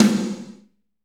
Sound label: SNR, Snares, Roland